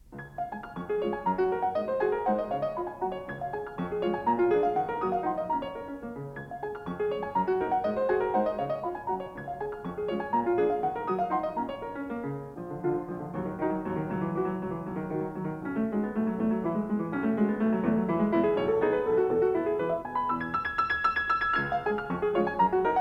Waltzes for piano, 4 hands (or piano), No. 6 in C-sharp Major. Vivac; Waltzes (16); Brahms